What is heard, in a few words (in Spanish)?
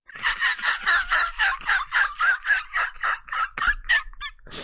Risa, de ibai